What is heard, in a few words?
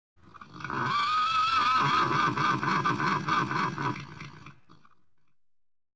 Animals, Laughing Horse; BBC Sound Effects Library